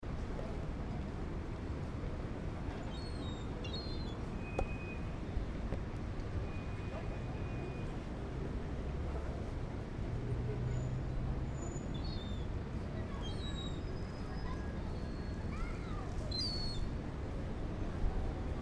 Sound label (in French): mouettes